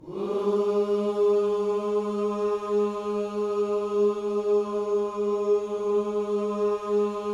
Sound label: WHOO G#3E, Vocal Planet Gospel; WHOOS; ILIO